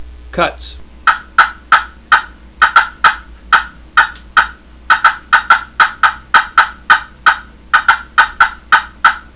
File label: slate cutts, turkey; Woods Wise